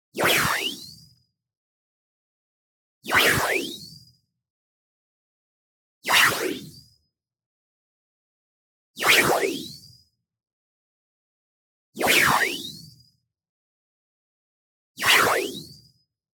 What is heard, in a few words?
WHOOSH Rising Flanger Noise Sweep; boom library; Anime Essentials